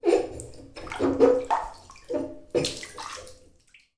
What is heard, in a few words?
household bath foot toe rub tap squeak